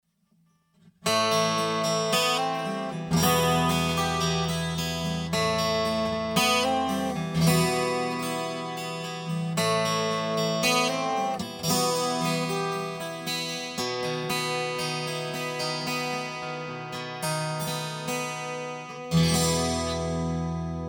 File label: 12 string Yamaha APX9 12; Fishman Aura Spectrum; Comp 50